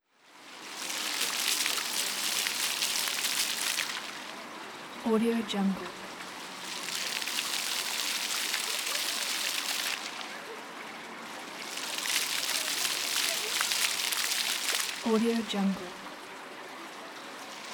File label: automated city fountain